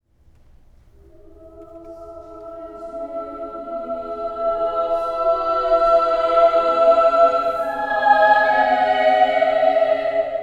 childrens Choir

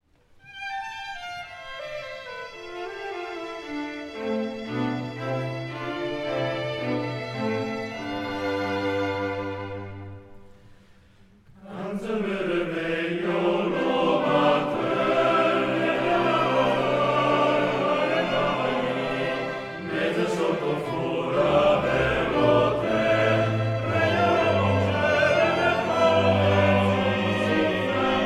male Choir

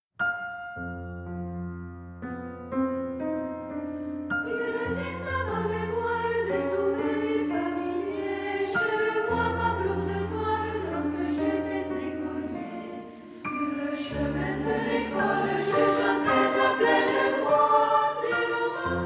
female Choir

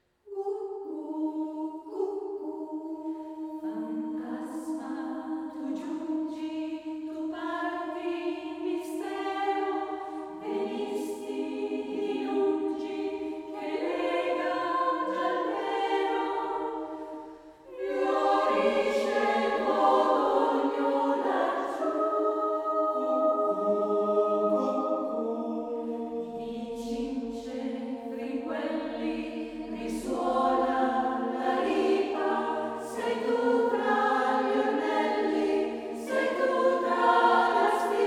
mixed Choir

